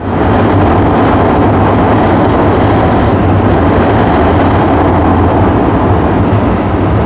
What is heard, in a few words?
light wind; PC Gamer IT CD 41; Daikatana